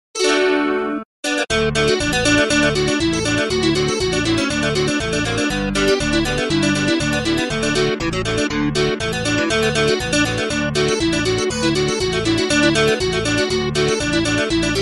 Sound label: traditional bluegrass electric mandolin; syntheway banjodol